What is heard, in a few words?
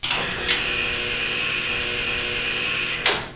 Armature Extend; State Football - Ultimate Multimedia Experience